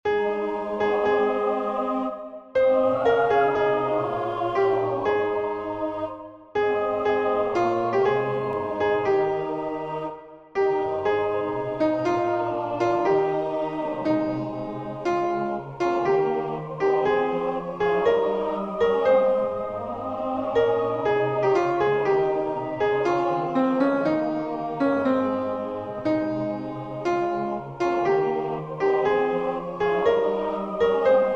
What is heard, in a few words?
Come Again, Sweet Love - alto, choirs